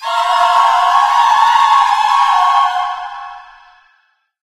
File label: loud angry little witch, music; Left 4 Dead 2; Source Engine